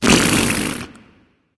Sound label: tongue, voice; smite; voicepack; ymir